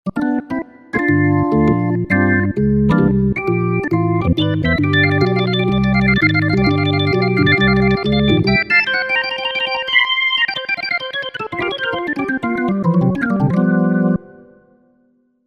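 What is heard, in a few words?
Jimmy Perc, organ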